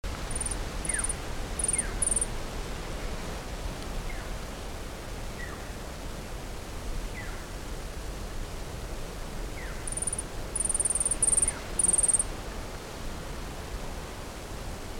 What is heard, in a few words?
Hylocharis chrysura